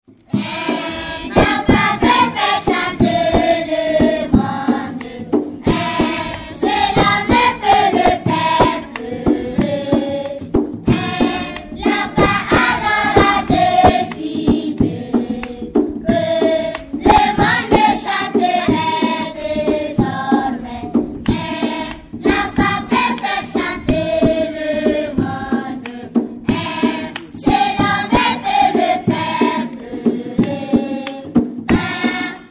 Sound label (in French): Chant d'enfants; voyage a boulsa novembre 2010